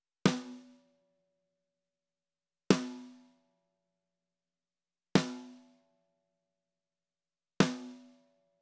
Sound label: Snare Fet II Fairchild; Daking